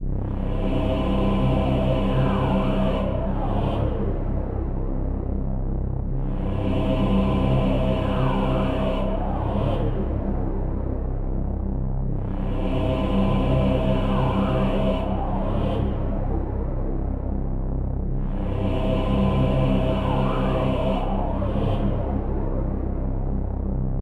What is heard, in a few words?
Cave Ambience; AM2R; HDR HQ in-game music